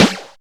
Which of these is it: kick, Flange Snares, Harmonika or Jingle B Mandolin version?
Flange Snares